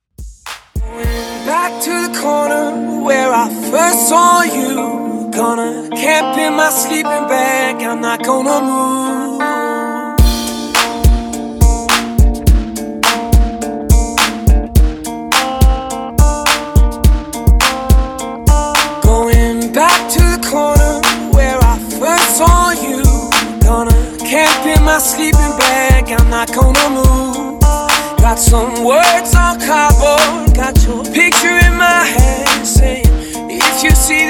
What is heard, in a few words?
Alternative Re-Drum 105 BPM; remixplanet